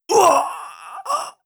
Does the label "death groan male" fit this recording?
Yes